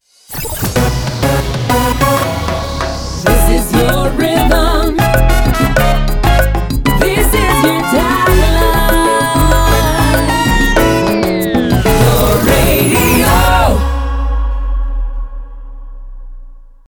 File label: Latino Chikita 96 Bpm Mi Pitch